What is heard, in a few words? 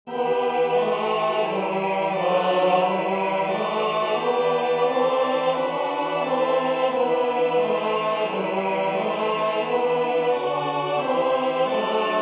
Dormi Jesu tenor, synth; carols